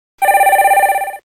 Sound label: PHONE